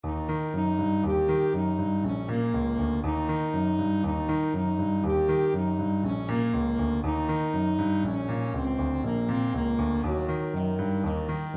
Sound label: saxophone; i have lost you